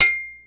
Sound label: Metal